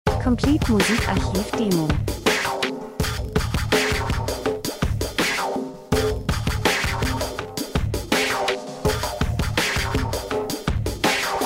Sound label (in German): drums, rhythmus; schwerfällig Elektro Pop Rhythmus Lifestyle Positive Stimmung Urban Club Abend Feiern R & B Industrie Dokumentation